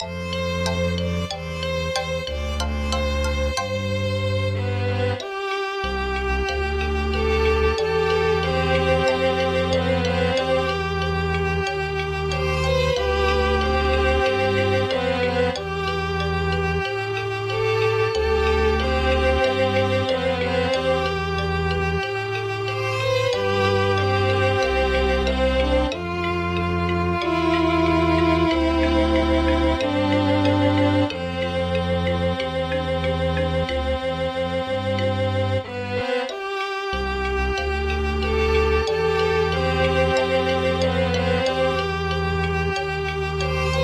Orchestral; Sim City SNES; Village Theme

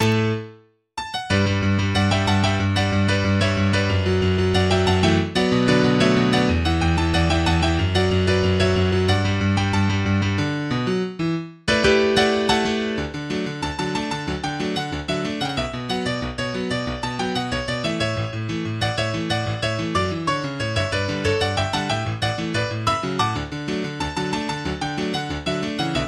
Piano Cover

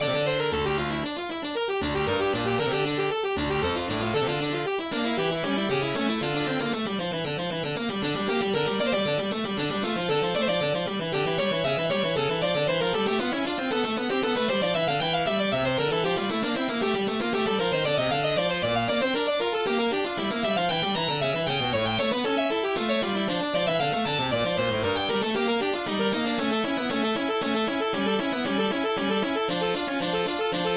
in D Minor

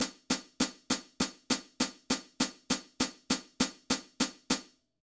Hi-hat

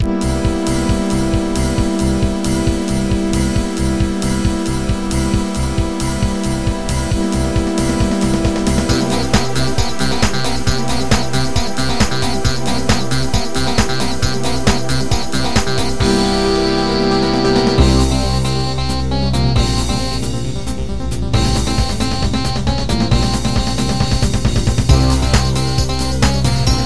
Techno

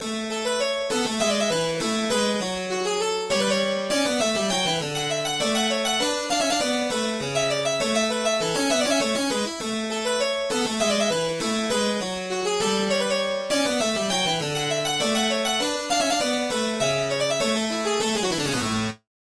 Harpsichord solo

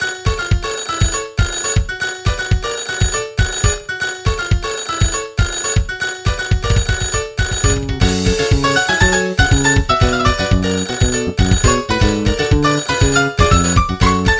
Nokia Montuno

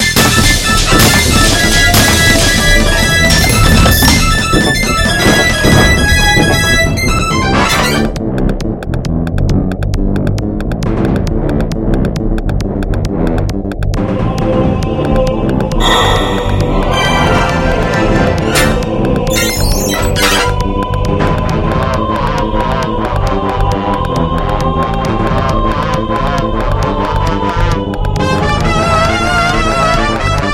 midi; sonic 2 boss

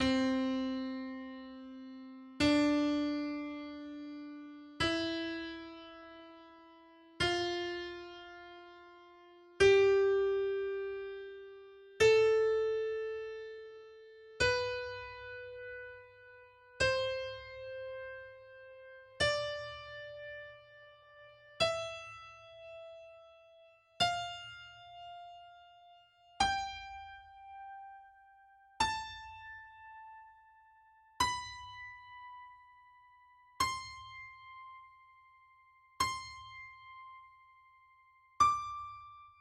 Whole Note Scale

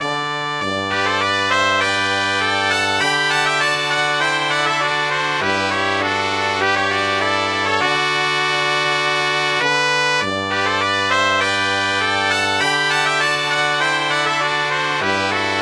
Fanfare

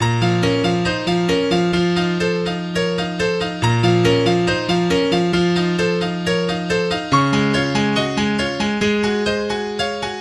Nokia Nocturne

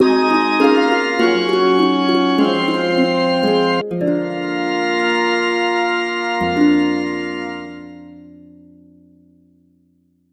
Game Over

